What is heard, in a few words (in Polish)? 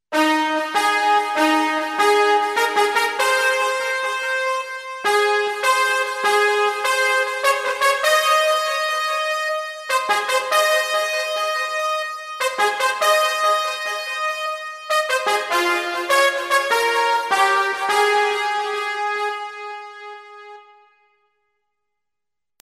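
hejnał; hejnal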